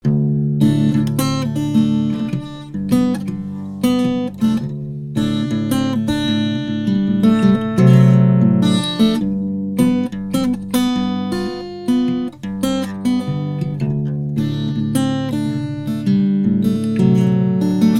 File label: samson meteor mic acoustic guitar